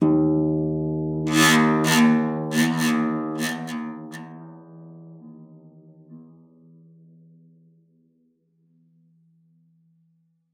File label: PEDX D2, HARP; Xsample VOL-8; HARP+FX